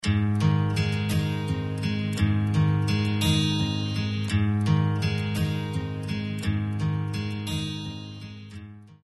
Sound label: Ringtone